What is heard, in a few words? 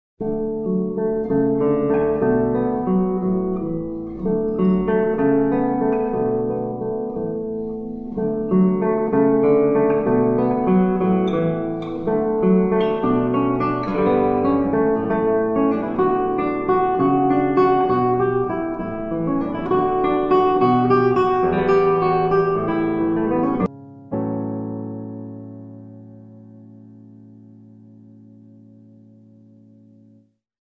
Barcarola In D Major, Classical Guitar